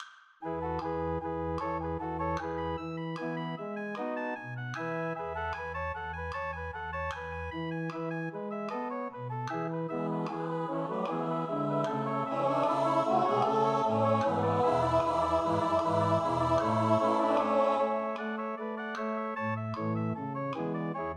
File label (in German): Ehre sei dir Gott Tenor; Takte 54-98; Kantorei